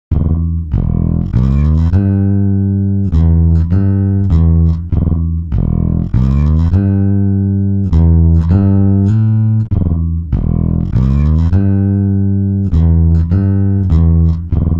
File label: bass; ikm sampletank stretch; timestretch